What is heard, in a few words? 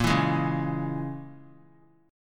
Bb Minor 7th Flat 5th, guitar; Bbm7b5 strummed